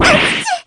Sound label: shock; celica